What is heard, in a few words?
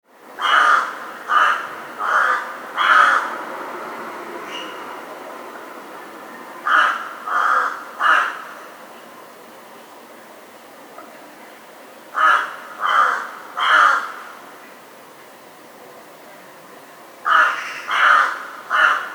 crow cawing sound effect